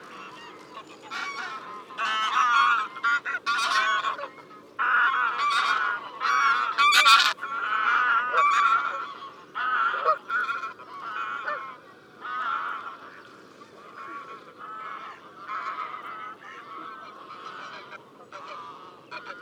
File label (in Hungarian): nyari lud hangja